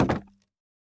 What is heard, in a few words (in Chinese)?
竹木栅栏门开关声